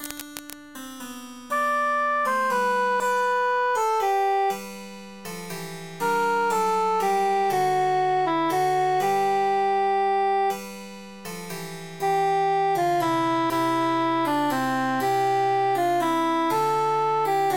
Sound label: cantus emphasized; follow me sweet love